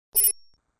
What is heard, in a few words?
click